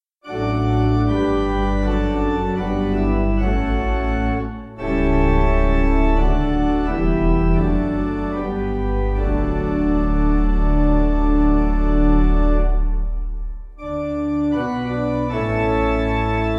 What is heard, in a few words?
Pipe